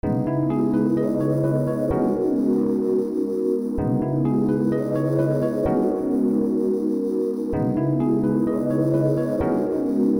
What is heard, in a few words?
Serum Key